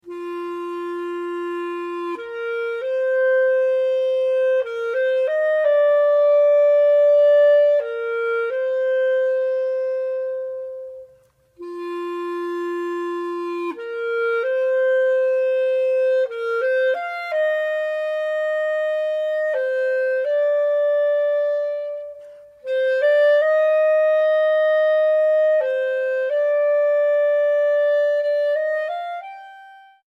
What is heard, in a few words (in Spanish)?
Clarinete; honduras musical 7mo grado